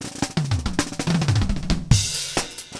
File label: Drum Solo; tsmtoolbox